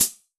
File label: Yamaha RM50 Pedal Hi Hat